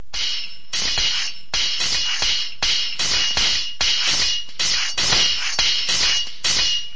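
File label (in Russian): бой на мечах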